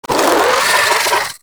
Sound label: Vocal Attack; Warframe; Moa Satyr